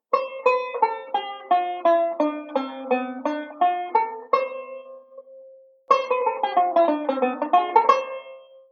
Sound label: Modal Sequence; Beyond Chord Melody Volume II